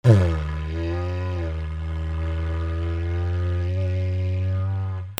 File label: MUSICAL